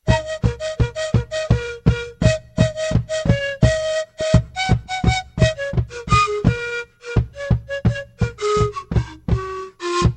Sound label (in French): instruments flute de pan